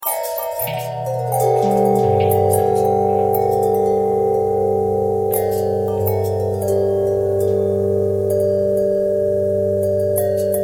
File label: bell long bell harmonic twist